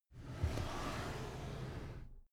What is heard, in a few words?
drawer cabinet carton open soft